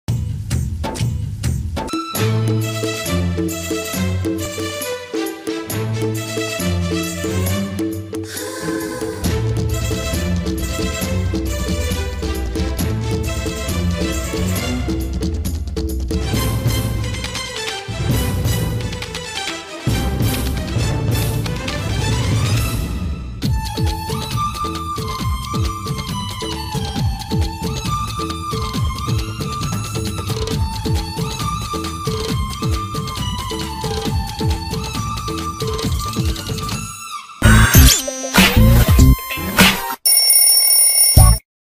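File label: background music ringtone ringtones caller tunes; prabhas; varsham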